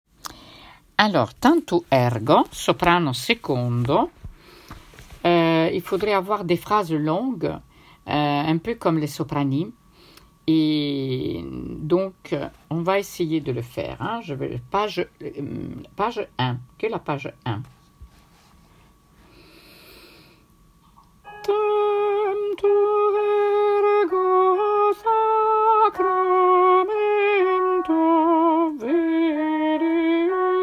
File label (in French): soprane; tantum ergo; Tantum Ergo de Gabriel Fauré